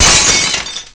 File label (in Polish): szklo